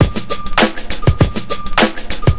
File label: Clapper A, funk; сэмплы ударных